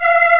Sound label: CHOIR G3; AKAI S-Series CD-ROM Sound Library VOL-2